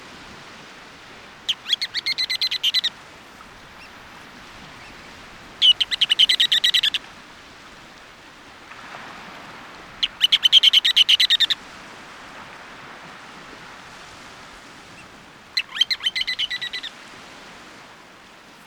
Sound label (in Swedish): Roskarl